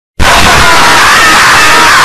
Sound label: jumpscare